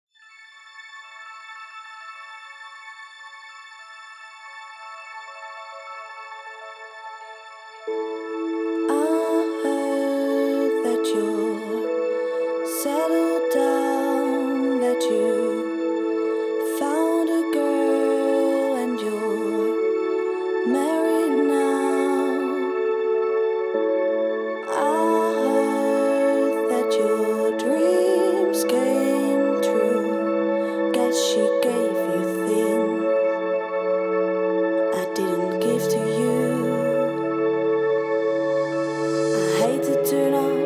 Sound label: Bells; eerste demo